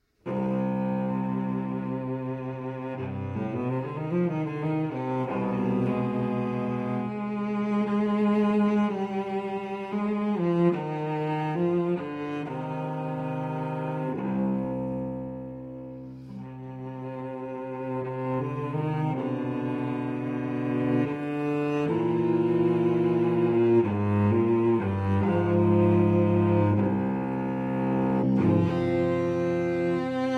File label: Cello Suite in C Major Prélude; Cello Suite No. 3 in C Major, BWV 1009